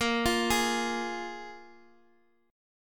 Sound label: F Minor Double Flat 5th Bb